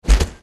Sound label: shot; nerf; rampage